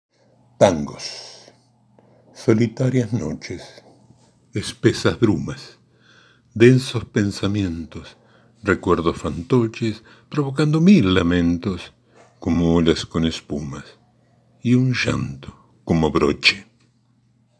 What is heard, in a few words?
Tangos